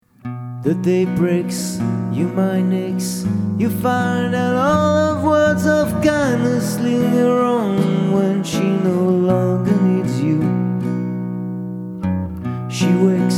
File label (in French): Guitare voix, Solo